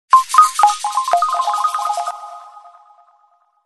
rain drops; A3100

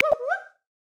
Skywalker Hoolock Gibbon; Xiaomi Redmi-Note-8-Pro; South West Mountain

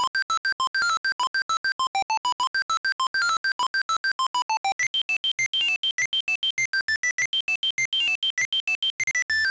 MONO cartoon; Nokia; Monophonic

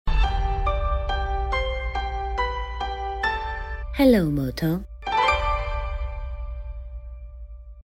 Morning Call, alarms; Motorola RAZR 50 Ultra XT2451-4 China; Reba

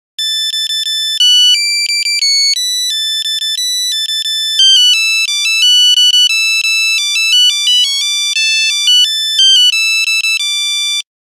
Nokia 3210 Matilda, Nokia Buzzer; Windows Phone apps

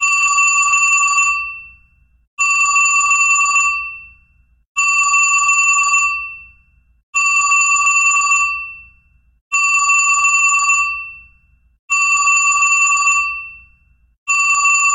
Vintage Telephone; LG; Optimus Vu F100S NewFW

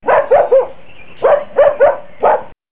Dog; Samsung; SGH-T100

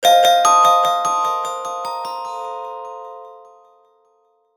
Safflower, ringtones

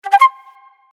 Flute; LSO; Vertu; Signature-Touch

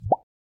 Email Sent

